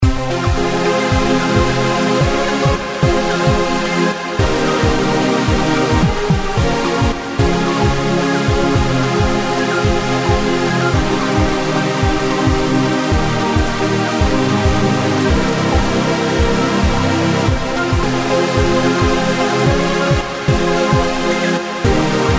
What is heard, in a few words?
Transy phrase sample